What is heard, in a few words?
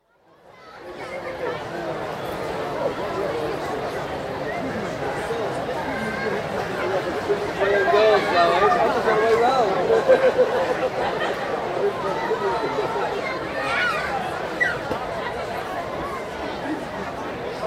Crowd, exterior, general cheerful hubbub of large crowd in open field, with some close voices; Crowds Exterior; BBC